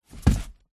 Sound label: foley cardboard box set down on gritty hard surface; zapsplat